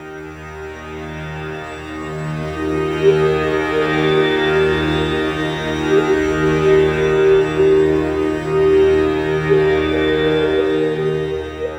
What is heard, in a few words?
World Lick; Sonic Foundry (Sony Creative Software); Ambient Grooves; World Licks